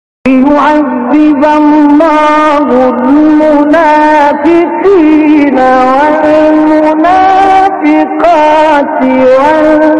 تلاوت زیبای آیه سوره احزاب; آیه 73; استاد مصطفی اسماعیل

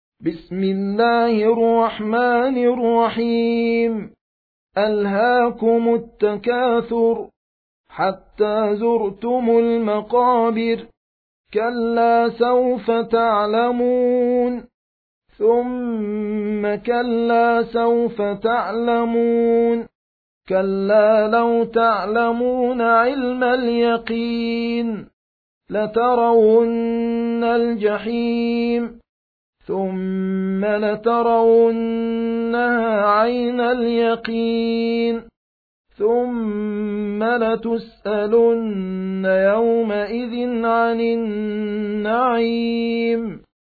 ترتیل; سوره تکاثر

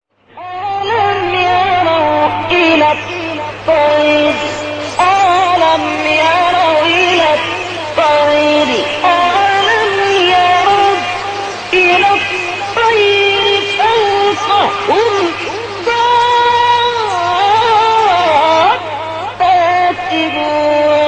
شاهکار زیبای از آیه سوره; 19; ملک

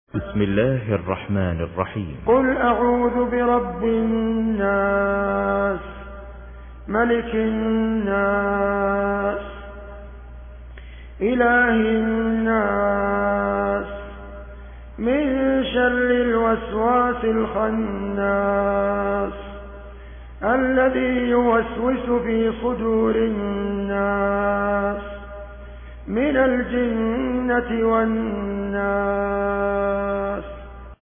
ترتیل بروایت حفص عن عاصم; سوره ناس

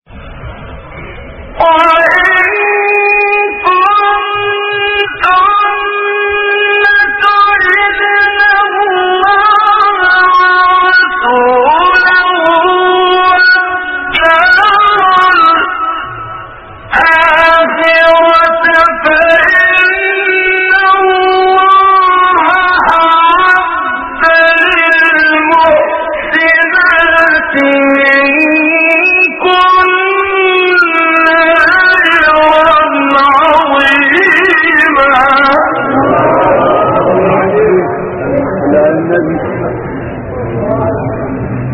تلاوت ملکوتی از آیه سوره احزاب; استاد منشاوی; آیه 29

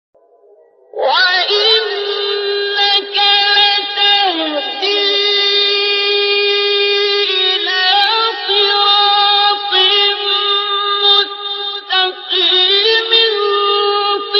تلاوت ملکوتی از آیه سوره; شوری; عبدالباسط

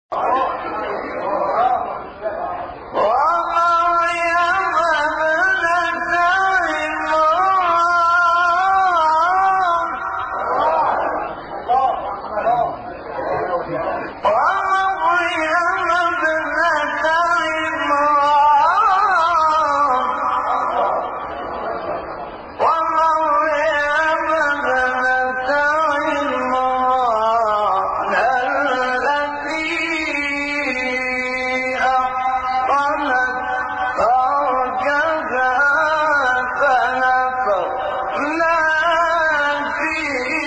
تلاوت آیه سوره; تحریم; استاد محمد احمد شبیب

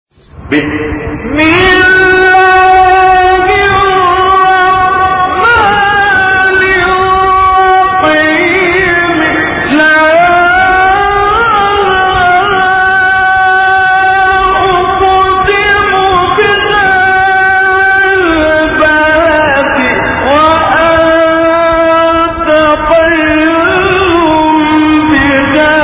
فراز زیبای سوره مبارکه بلد

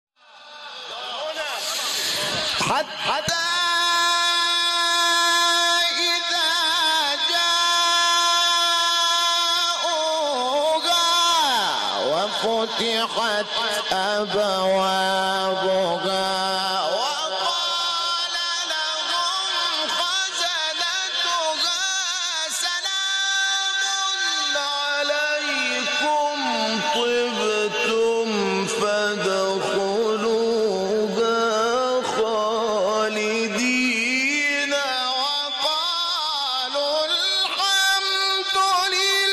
فراز زیبای آیه سوره زمر; آیه 73; استاد محمود شحات انور

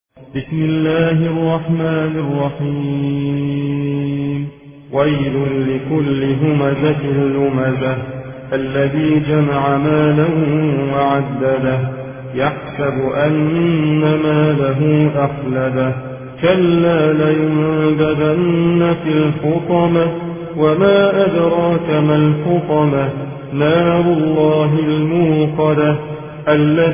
ترتیل روایت حفص عن عاصم; سوره همزه

